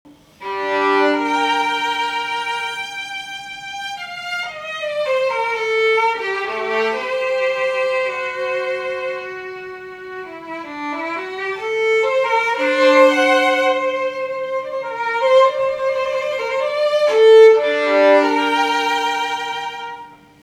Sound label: violins